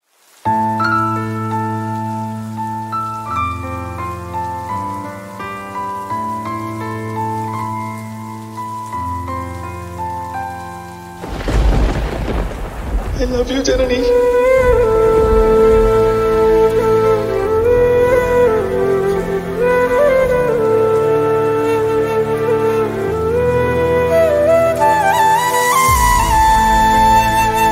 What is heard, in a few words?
bgm flute cover; moonu